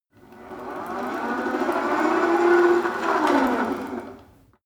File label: rope trainer pull sequence slow short; sport freizeit; fitnessstudio